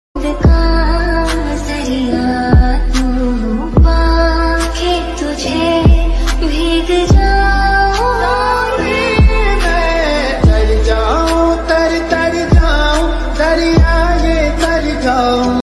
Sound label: sad background music ringtone; tiktok